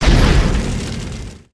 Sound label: summoner skill explosion; MUDevs S20.1-3